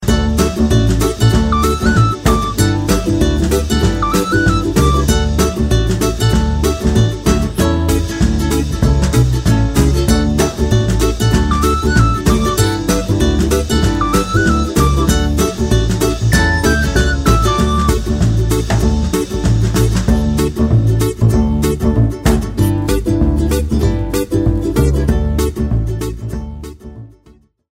amusing nice happiness musical tone ringtone

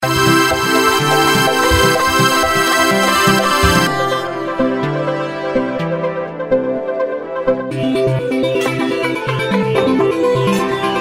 jazz top ringtone

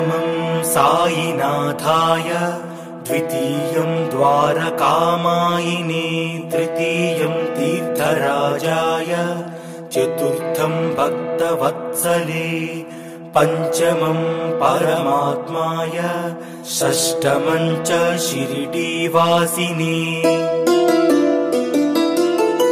telugu music ringtone

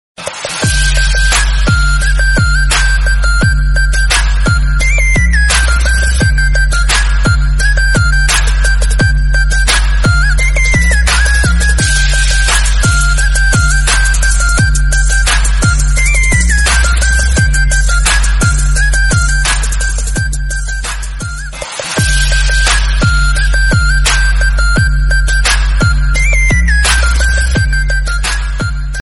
new call ringtone

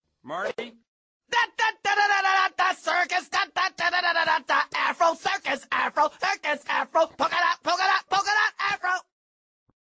afro song ringtone; madagascar 3 martys circus

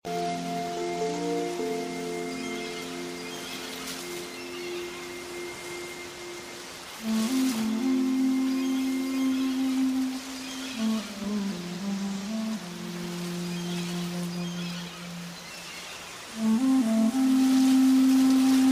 waterfall soft flute

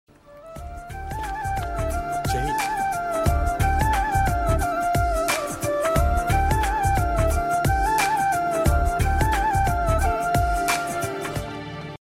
flute ringtone

